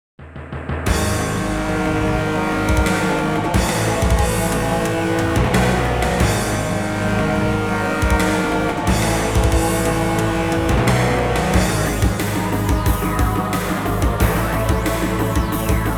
Ending; Scream of Pandemonium; Goulan; Technosoft Game Music Collection Vol. 8: Dimension

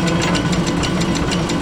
Cog Heavy

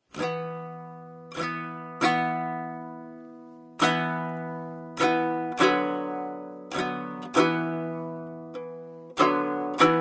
bard kitara; assassin s creed valhalla

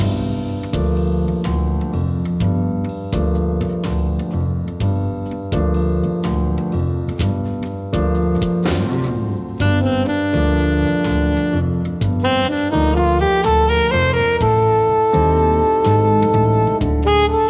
Jazz In 2-3-5; Old Skies

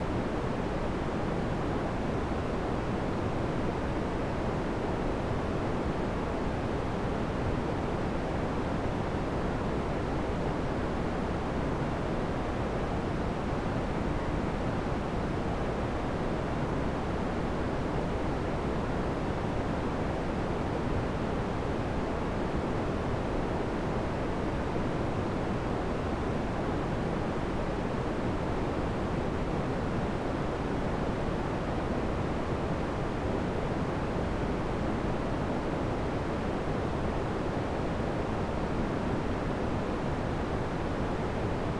AMBLOOP; Manhunt Prototype; PS2

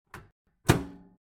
Microwave Close

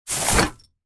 Trash Bin

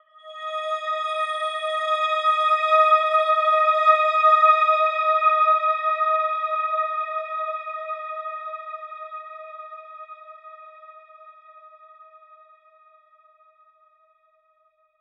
High Pitch; Blue Prince; Xbox Series X/S

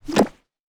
Help Friend

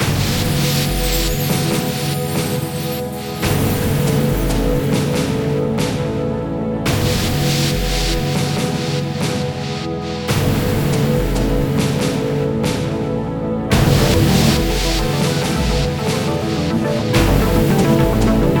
win loop

